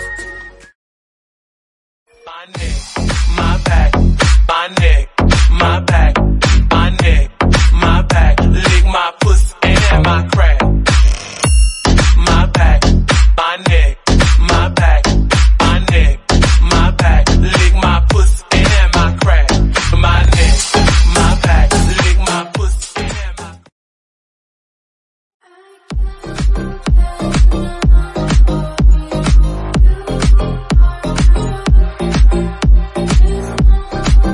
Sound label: Attitude Music Ringtone